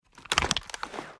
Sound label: g36c